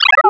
Arcade Chirp; Video Game Sounds; 1001 Sound Effects

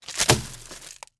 Trash into Trash Can; Office Supplies

